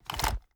sks reload empty end; Tacz1.1.5+; RSWS 1903

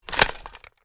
ak47 reload empty shoulder; Tacz1.1.5+; RSWS 1903

